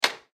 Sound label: vend mach button out